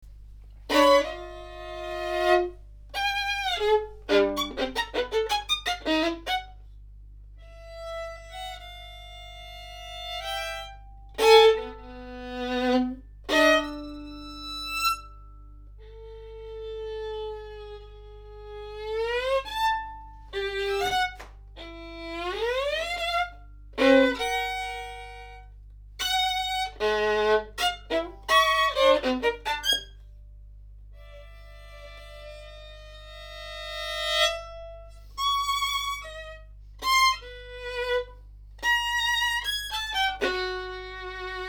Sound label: violin